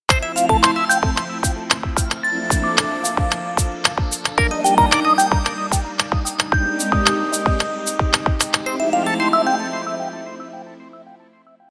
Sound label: ringer flow